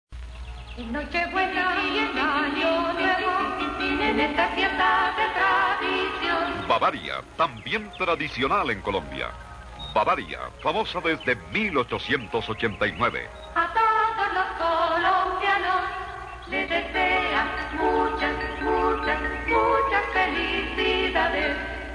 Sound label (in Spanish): Jingle navidad; Bavaria; Sutatenza